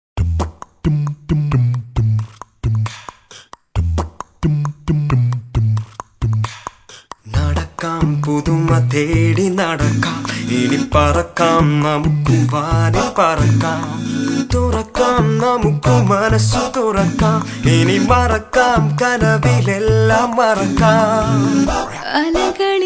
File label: Accapella jingle